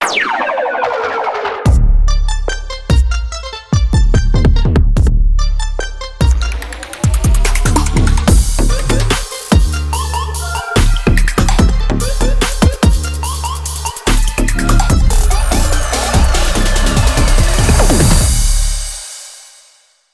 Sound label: Podcasting Prelude